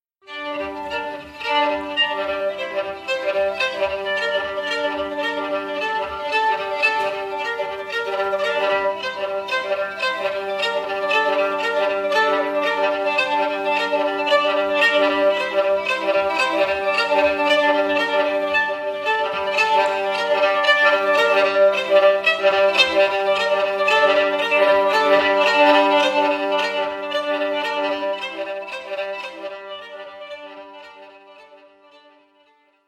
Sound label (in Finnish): arpeggio; bjorko